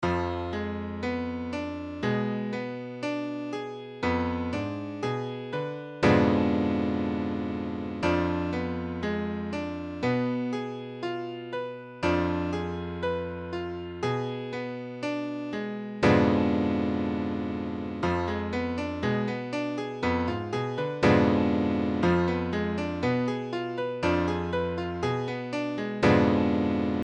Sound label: Fdim7, piano